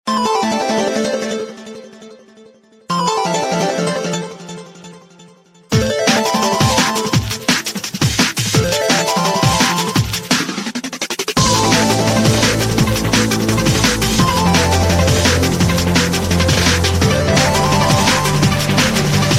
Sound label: galaxy s3 ringtone